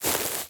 Footstep Grass

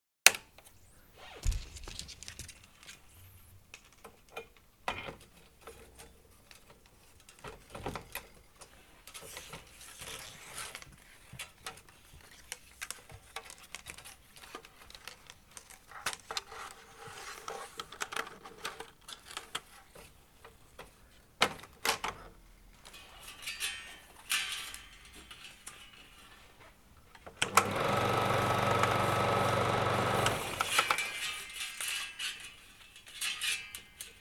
16mm Projector

